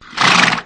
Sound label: mount; sfgame; mounts